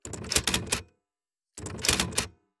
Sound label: hammer mechanic erase